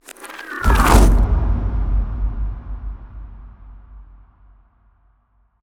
Impact; 7rystan's 600+ FREE EDM SAMPLES & DRUMS; SFX

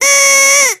"Yay!"; SFX; 7rystan's 600+ FREE EDM SAMPLES & DRUMS

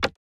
random perc; drums; perc oneshots; bespoke - Floral v.2

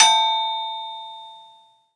Cowbell

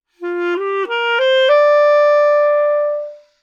clarinet phrase Bbmaj 100bpm; live clarinet phrases; bespoke - Floral v.2

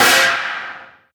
Snare

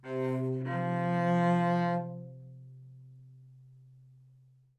live string phrases, string phrase Cmaj 100bpm

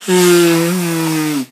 Blowing nose, Foley; SFX; 7rystan's 600+ FREE EDM SAMPLES & DRUMS

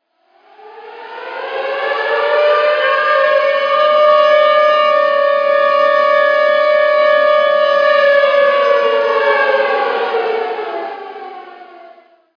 Air raid siren; SFX; Atmosphere; 7rystan's 600+ FREE EDM SAMPLES & DRUMS

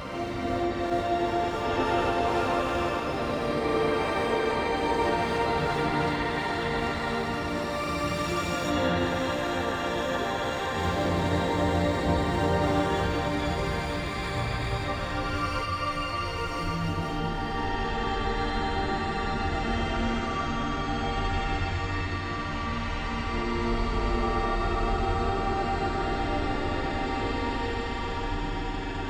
pad end portal cut Dm; base pack

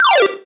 8bit fall; SFX; One shot; 7rystan's 600+ FREE EDM SAMPLES & DRUMS

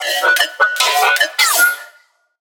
Synth

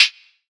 Hat; 7rystan's 700+ FREE COLOSSAL EDM SAMPLE PACK; Percussion; HiHats

